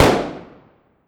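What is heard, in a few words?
weapon ar fire player atmo interior; gmod; viper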